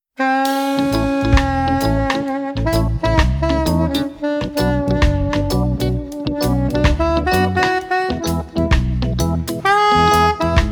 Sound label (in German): Fuultier reggae